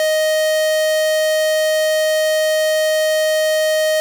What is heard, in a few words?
square, 808, SubOsc